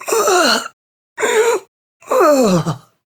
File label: Death; Warframe; Corpus Lich; Persona C